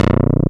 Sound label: arps; ODSSY BS; Sonic Reality; Vintage Timetraveler